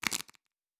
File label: cards shuffle